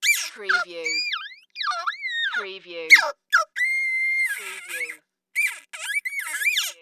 Monster Creature

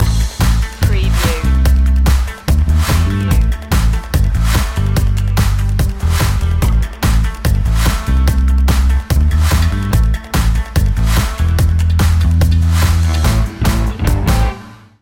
positive force

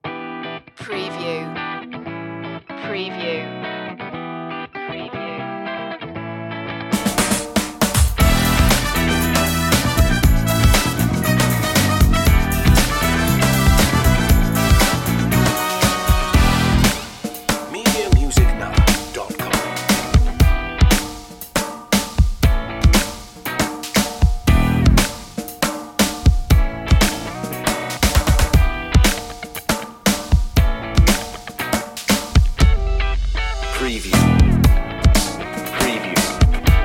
the funky one

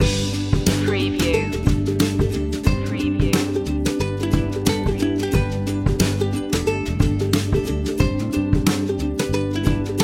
inspirational ukulele loop

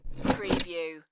Chain Metal Rattle Short

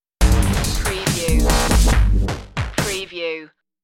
ice ragga stinger

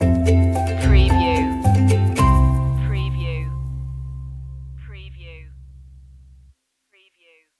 take my hand stinger

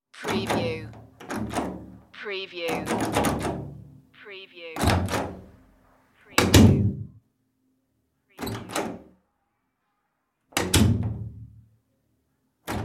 american door knob

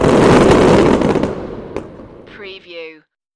Big Bang

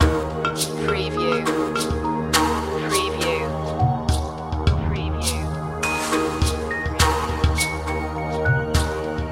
mountain fog loop

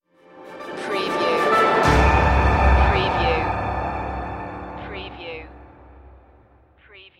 acid rain stinger

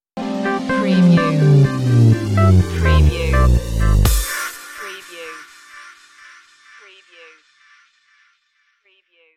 bass stinger

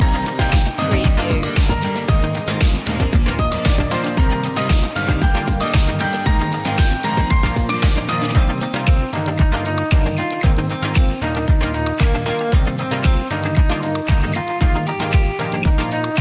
electric lights loop end; media music now

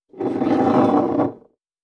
Bottles Pushed On Counter; beanstalk audio

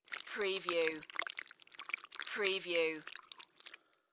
Squish Long Juicy

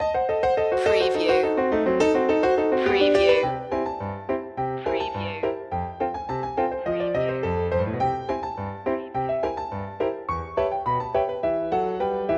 ragtime

